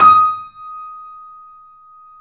D#, 3D Pianos, Boesendorfer; E-MU Producer Series Vol. 5; 3-D Audio Collection

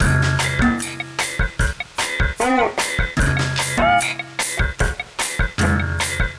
Brushes at 150 busy modulated

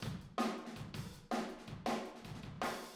Room, Drums, 162 BPM

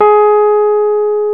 PNO, Rhodes Mk.V; Keyboards of The 60's and 70's

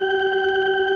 ORCHESTRON G G3; Sonic Reality; Vintage Timetraveler

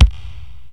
BD, Dance Drums; Best Service ProSamples vol.15; AKAI

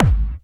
hard bass drumz

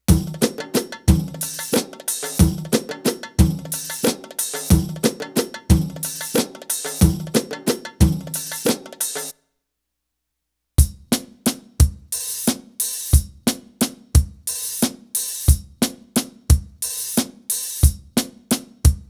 Arabic Rhythms

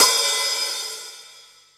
open, Drums Percussion; Drum Bass; Zero-G; Total Drum Bass

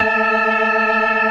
57 STOPS, Synth and Organ; InVision Interactive; Keith Emerson; The Most Dangerous Synth and Organ; ALL STOPS

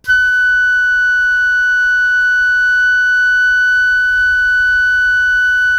FLUTE MF F#5; Greytsounds - Philip Wolfe David Crozier's Saxophones

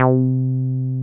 303 C4 mp; Drums In Your FACE!

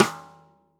stickshot - snares on; JazzFunkKit

